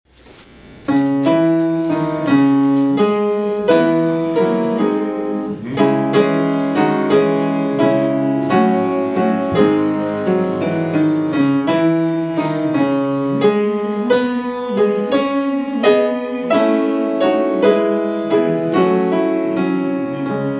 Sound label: Hymn; Awake, Awake, Fling Off the Night